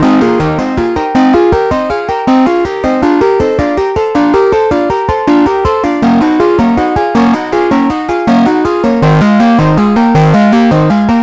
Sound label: Synth Arpeggio, Synths; Eclectic Electronica